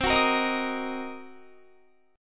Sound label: C Minor 7th Flat 5th Guitar, chord